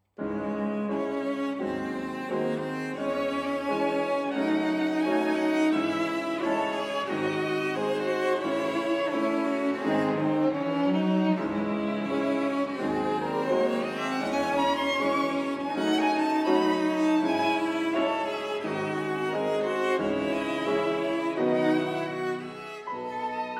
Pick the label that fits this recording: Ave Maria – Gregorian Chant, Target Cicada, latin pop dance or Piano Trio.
Piano Trio